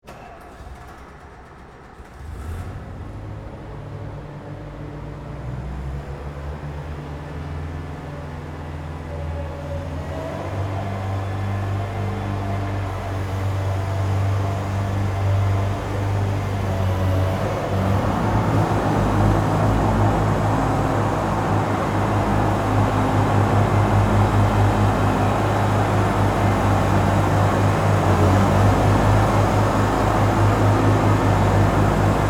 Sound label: Zamboni Run, Sports, Zamboni, Start, Operate, Off, Center, Ice, Perspective, Follow; Hockey; BlastWave